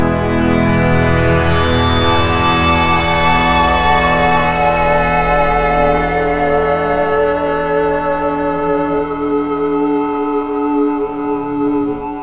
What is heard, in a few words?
e maj, guitar chords